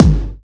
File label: low mid urban tom drum single hit g sharp key, Royality free tom sound tuned to the G# note